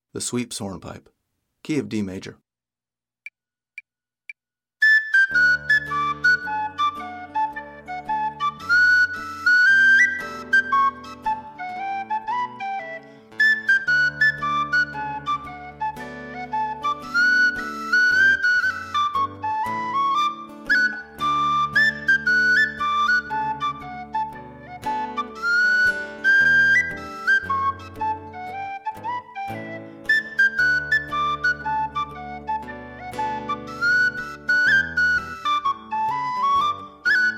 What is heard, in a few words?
sweeps